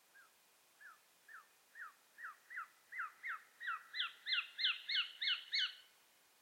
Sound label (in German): sperlingskauz